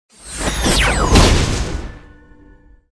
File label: attack ham